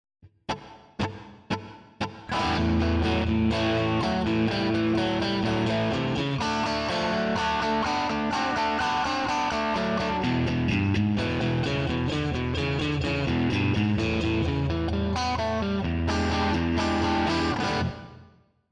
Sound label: Fat Strat